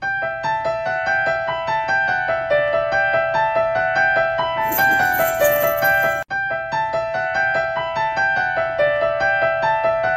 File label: Piano Music for Ringtone